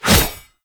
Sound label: Bayonet Melee Whoosh Fast; Warframe; Tenno